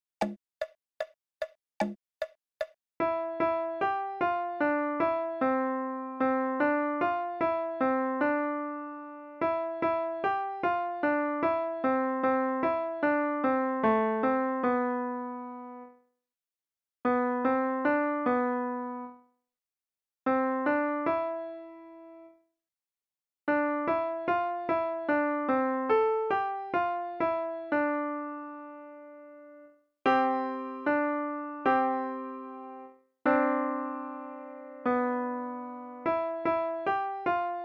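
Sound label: Barn Cat RH, piano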